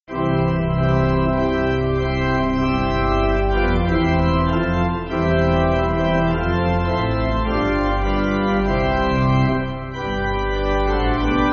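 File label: Pipe Organ, Free Music Backing Files for Churches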